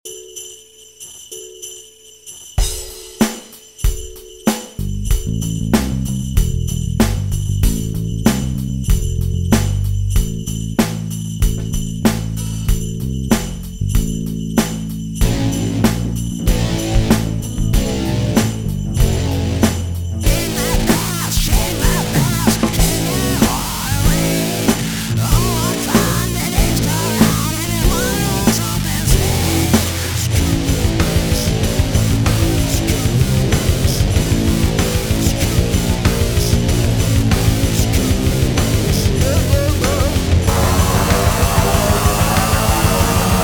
Trash Bells

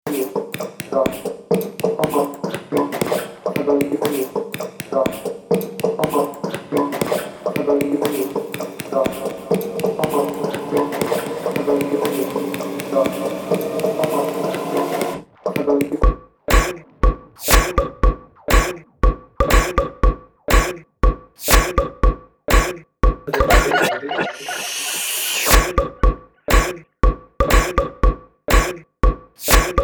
uhhhh ahhhh ehhhh

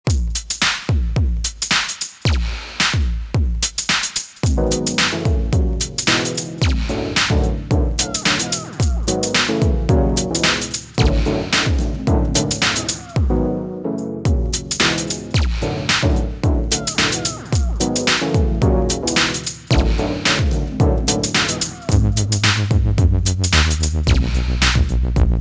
slow down fast hard

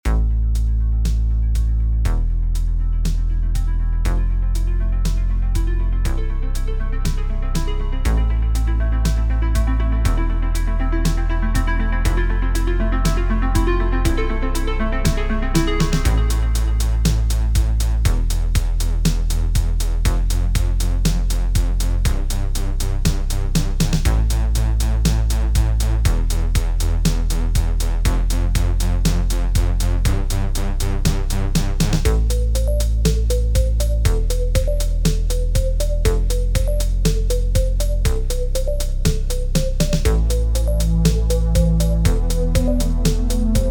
Synthwave

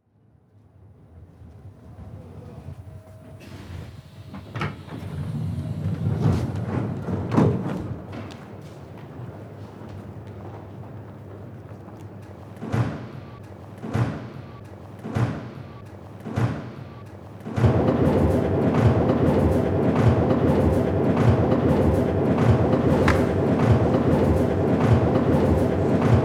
ambient train; bbc

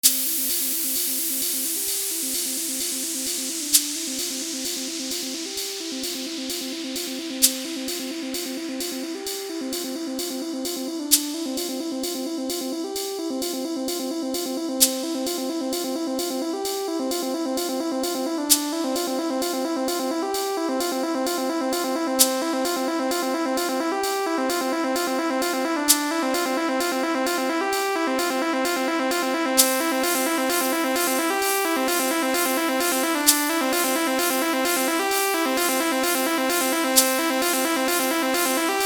noise maker

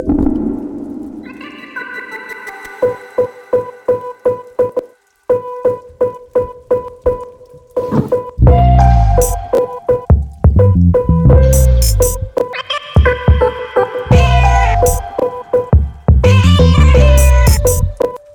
Cat Meow

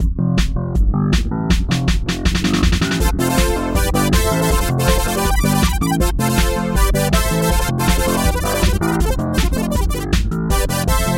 synth euro

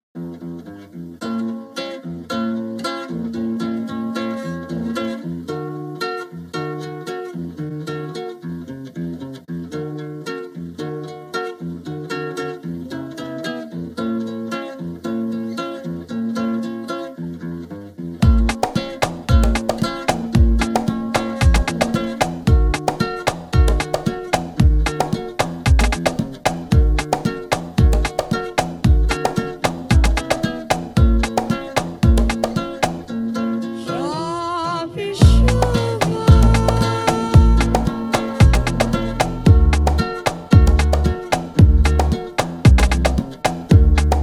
sambass reggaeton

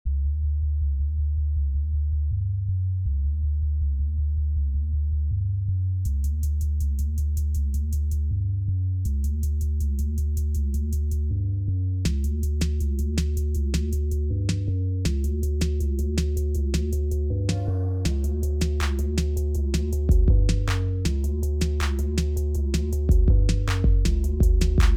noise trap

